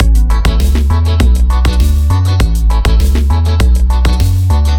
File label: Jingle Raggae